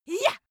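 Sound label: female attack yah, screams; schreie; menschen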